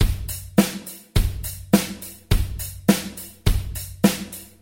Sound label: slow rock drum basic 104 bpm